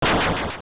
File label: Sword; Swing the Biggoron's Sword; Oracle